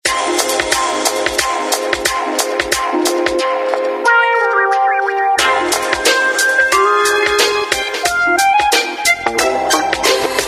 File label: Fugue